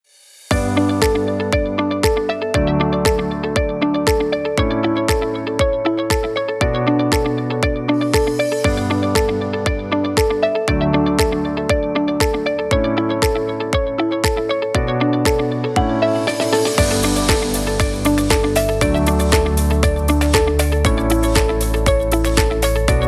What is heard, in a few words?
Inspiring Upbeat; Cultivarte; curso explora las emociones